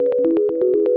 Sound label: 122 BPM Arps A maj F min Tonic B; arp essentials